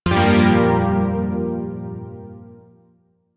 Shawzin Phrygian Chord J; Chords; Warframe; Zariman

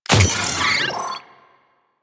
Drone Death Malfunction; Warframe; Venus; Venus Terra Attack Drone

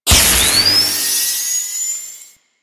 Mine Activate; Warframe; Veilbreaker; Sprag

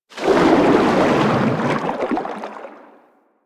Sharkwing Water Movement By, Vehicles; Warframe

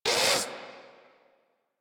Catbrow Combat Vocal Pain; Warframe

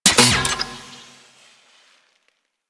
Torpedo Pistol Mech, weapons; Grn Torpedo Pistol

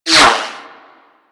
Bullet Whizzby; Warframe; Weapons

